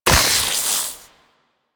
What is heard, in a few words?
Secondary Barrel Beam Attach, Weapons; Warframe; SU Modular Guns